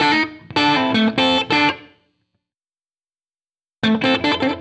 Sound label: BLUES GUITAR; Sonic Foundry (Sony Creative Software); Vital Drums